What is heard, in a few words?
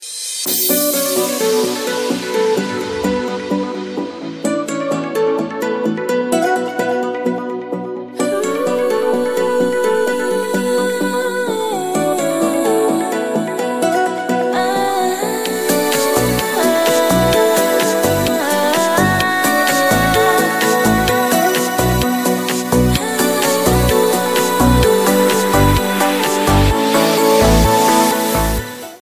Ringtone